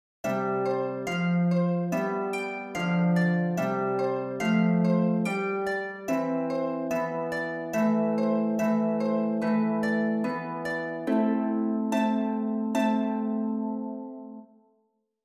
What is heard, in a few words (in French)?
HARPE